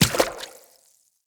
Infested Medium Run Water, Footsteps

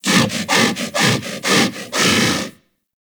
Kubrow Armored Vocal Breath Run; Warframe

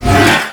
Derelict Mover Malfunction Start; Orokin

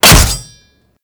Berserker Barrage Hit Metal A; Warframe; Warframes

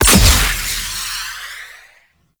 Projectile Fire; Warframe; Cephalon Weave

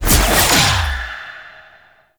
Choir Pool Cast

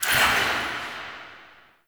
Select; UI; Hell Direction; Warframe; Tau Mission Menu

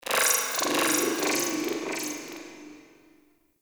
Corpus Ship Data Chirps, Ambience; Warframe; Corpus Ship Remaster

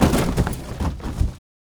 Cardboard Boxes Destroy; Ambience; Props; Warframe; Nineteen Ninety Nine

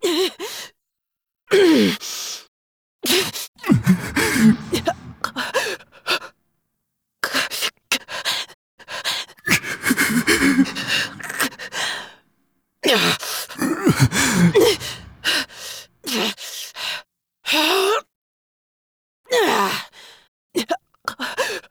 Rusalka Breaths; Kill Her Killer; Warframe; NineteenNinetyNine; Stage8ShitboxConvoy

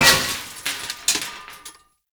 Chair Stack Destroy; Warframe; Ambience; NineteenNinetyNine

